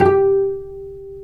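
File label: strings cello, dry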